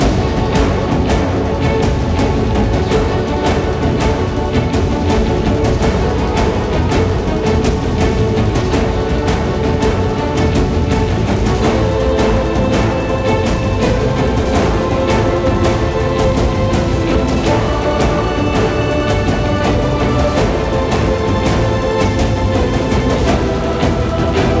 High Threat Loop; Route99

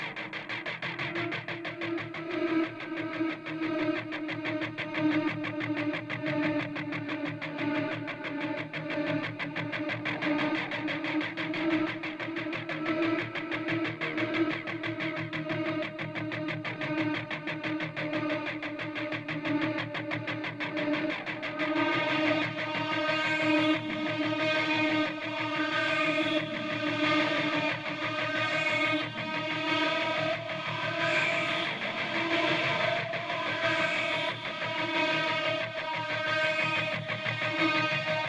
Synthwave 91bpm Guitar and Ambience Splash; hot lava; ios macos windows

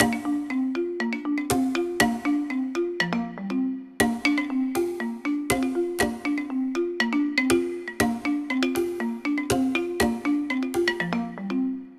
music world music classic intro

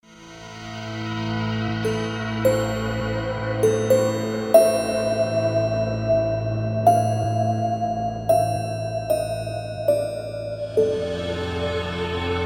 Ambient Menu Theme; Dungelot Shattered Lands

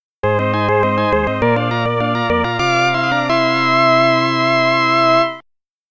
Game Success

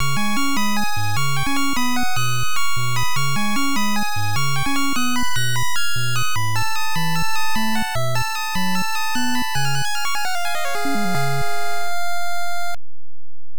Game Over; Blade Buster; Family Computer

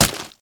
melon impact; Plants vs. Zombies

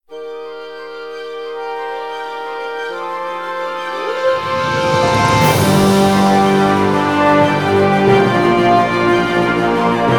Scene Victory; RPG Maker 3 Music Pack

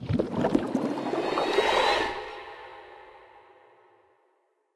Gillman Appear; Level27

